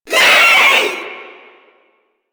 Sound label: Proto Infested vocal short attack